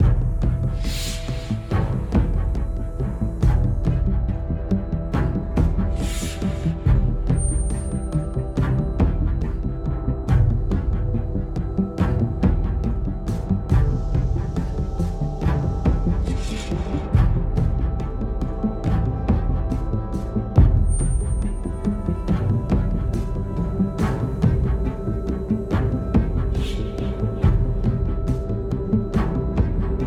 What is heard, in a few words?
Loop Tense; the walking dead michonne